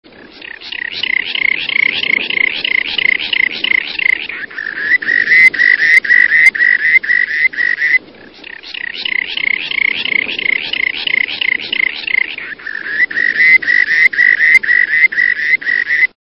Rock Sandpiper, songs

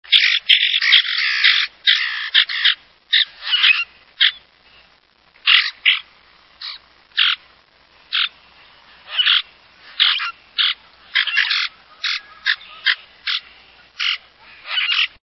Common Grackle, songs

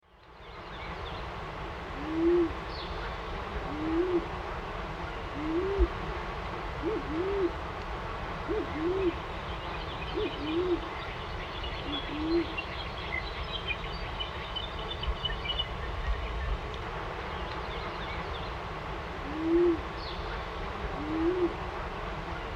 Band tailed Pigeon

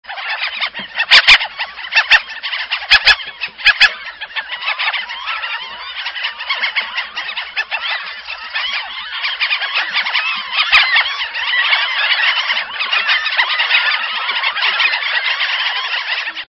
Franklins Gull, songs

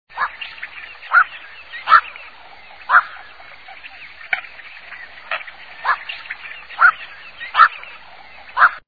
Black crowned Night Heron

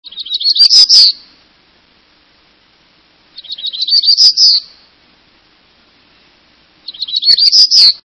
Black throated Gray Warbler

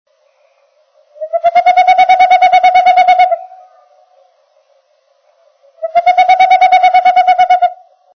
Boreal Owl

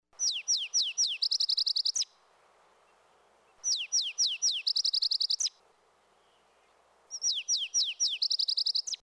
Yellow eyed Junco

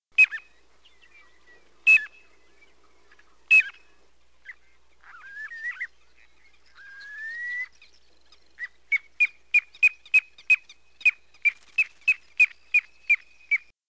Piping Plover

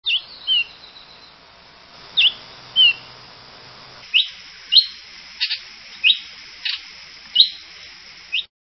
Streak backed Oriole

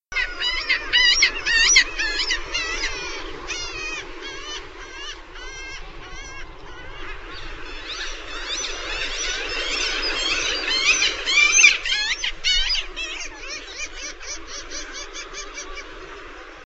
Red legged Kittiwake

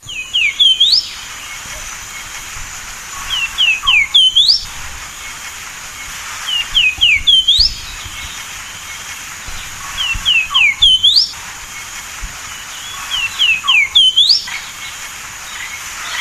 Golden crowned Warbler

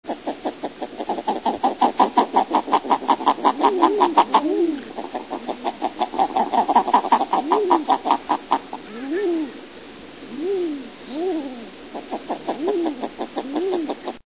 King Eider, songs

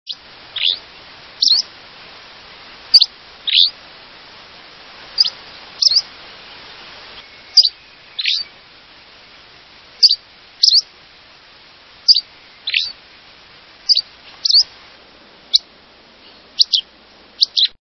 Dusky Flycatcher

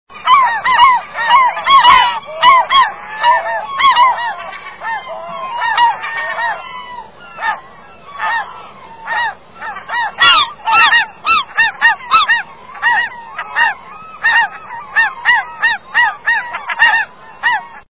Glaucous winged Gull, songs

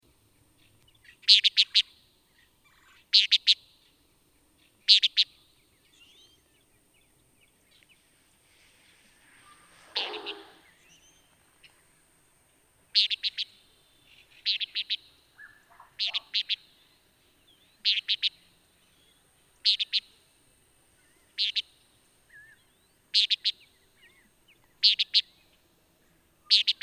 Antillean Nighthawk

